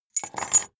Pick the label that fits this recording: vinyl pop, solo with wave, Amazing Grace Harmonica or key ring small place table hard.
key ring small place table hard